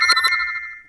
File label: acquire